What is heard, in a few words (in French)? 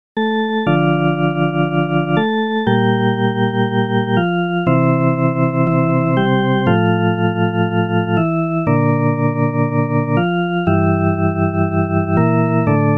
Agneau De Dieu Orgue; Messe De L Agnus